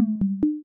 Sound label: poweroff